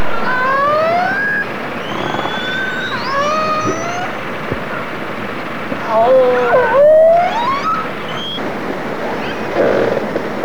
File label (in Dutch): orca geluid